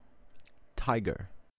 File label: Tiger